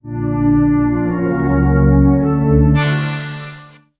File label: victory screen fantasy ca; instruments